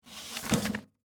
Mail Box Remove, Household, Mail, Box, Remove, Large, Envelope, Mail; Miscellaneous Household Sound Effects; BlastWave